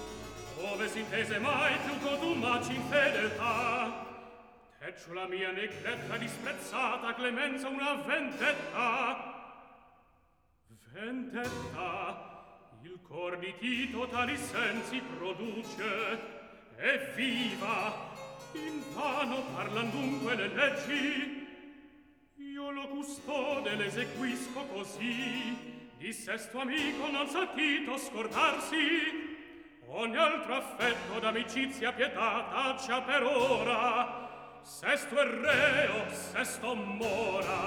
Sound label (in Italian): Atto Secondo Recitativo; Tito, Publio; Ove s'intese; Mozart; Operas